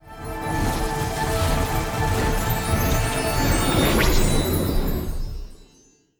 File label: MIRROR Anticipation; Mirror Magic; genesis